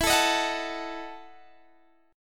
E Major 7th Suspended 2nd Suspended 4th, Guitar, DADGAD